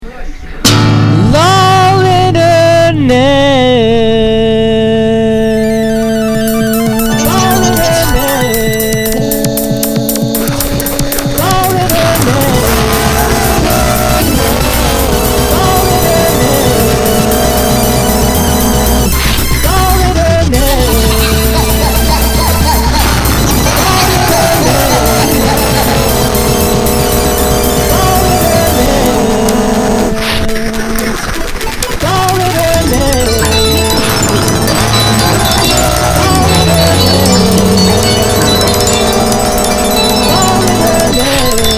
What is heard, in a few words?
Chorus (with occasional, repeated shouts of 'lol internet'); lolicore